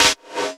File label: SD, 160 BPM, Drum & Bass; Ueberschall; Drum & Bass Flash Trackz